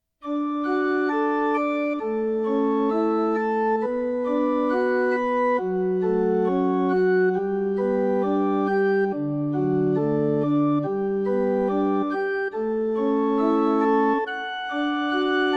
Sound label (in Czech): Canon D dur varhany; Canon in D (Pachelbel)